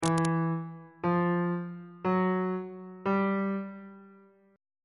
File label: d dim3 min3 maj3 aug3 intervals; bass clef